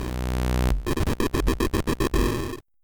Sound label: base explode